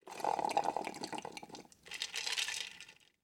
Human Drink Slurp And Shake Glass

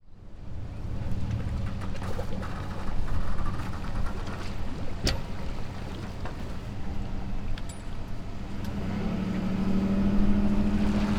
Boat Loading Into Water; Transportation

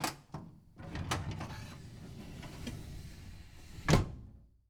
Filing Cabinet Drawer Opening; household

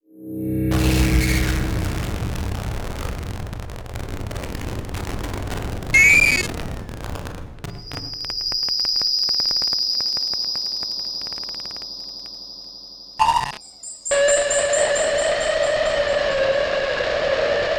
Distortion Random Static Buzz Beep; Module Production Element Title Imaging

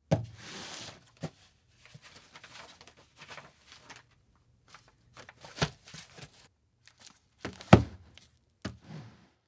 Household Notebook Open Look At And Close

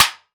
Weapon Mace Hit Metal Single; AdobeCC

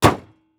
Truck Envoy Close Hood Exterior; transportation

